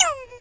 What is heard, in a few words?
ooof; yoshi; sm64coopdx; char-select-extra-chars